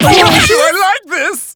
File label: damage